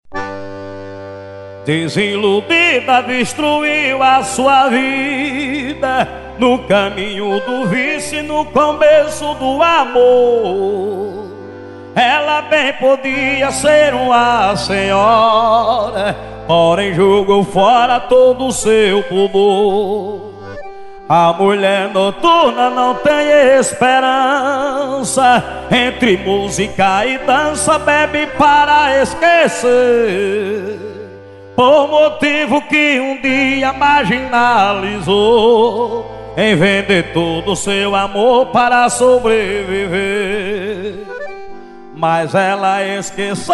viola

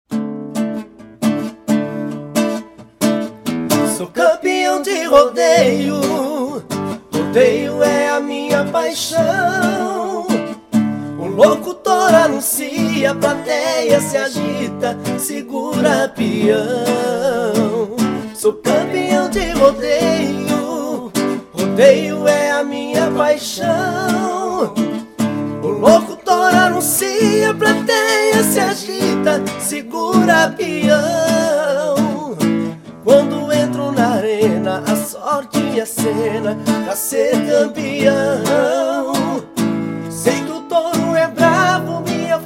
rock n rool sertanejo

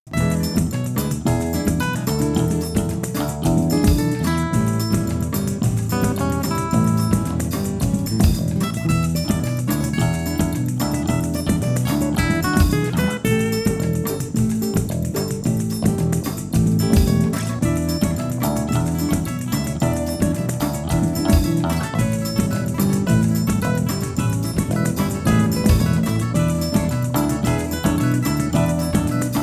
baiao

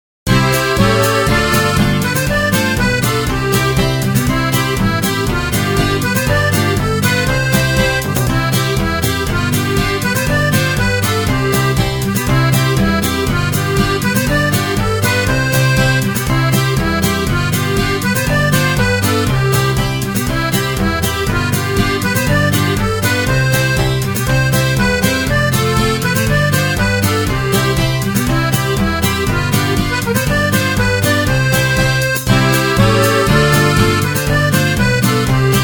polca junina instrumental

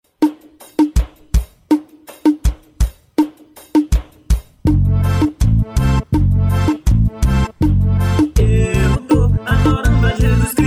com segunda voz

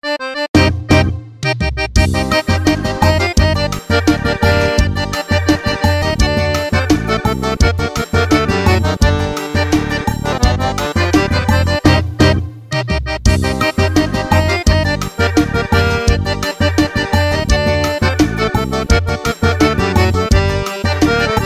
samples xote; samples internos; s650 s750 s950

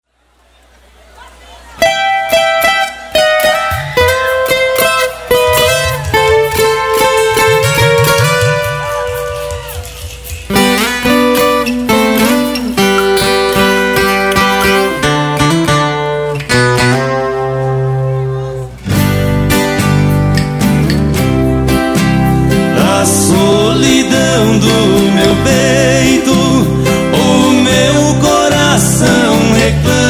pout pourri toadas ao vivo

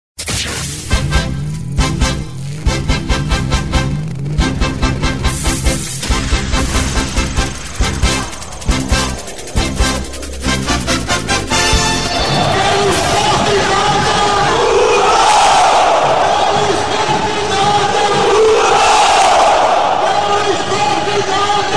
abertura

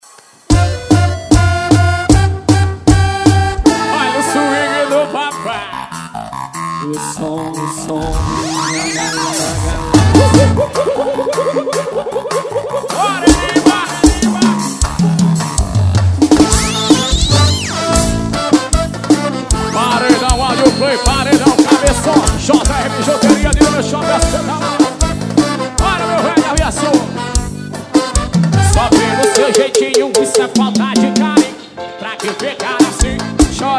forro ao vivo; ao vivo no sao joao de arcoverde

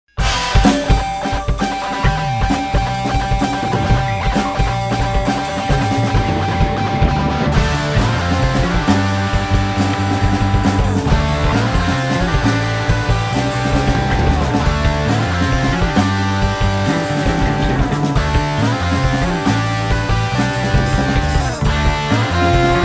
progressoes

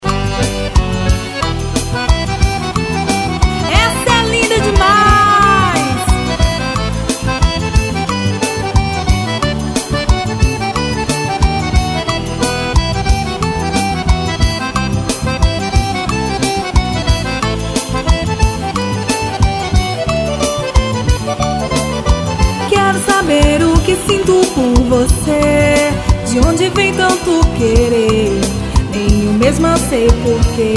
forró

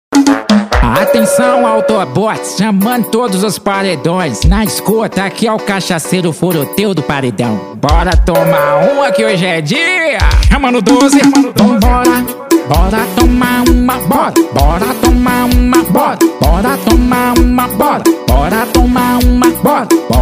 brega

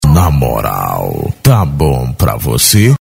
techno som

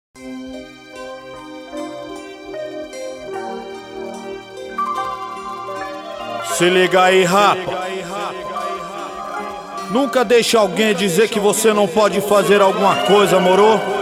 introducao vocal, rap

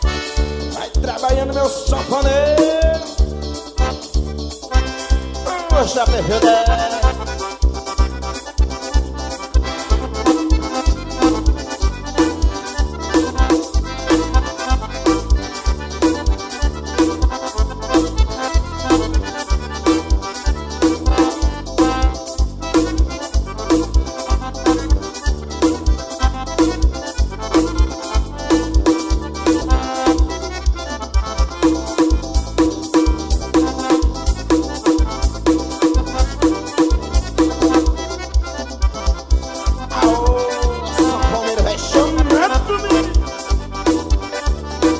forrozao ao vivo

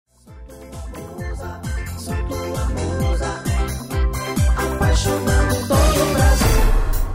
abertura

